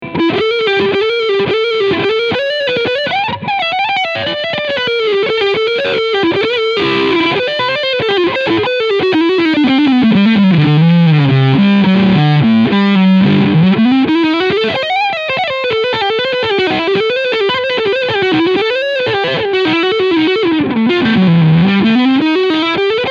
Harley Benton Dullahan FT 24 BKS Lead Sound Neck Pickup